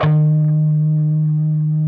PRS E, Guitars, LEAD

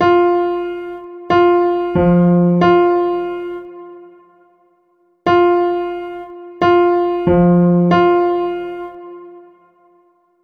Piano, cinematic funk; Sonic Foundry (Sony Creative Software); Cinematic Funk Urban Tone Poems

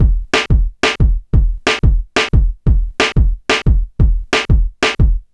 Kick Snare Loop

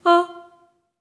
STACC F4, Symphony of Voices, SOP STACC

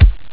bdrum; ST-XX Amiga Soundtracker Sample Packs

